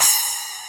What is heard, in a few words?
Splash